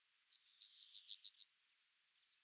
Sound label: bird, ambient